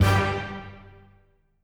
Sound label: Orchestra 2nd Star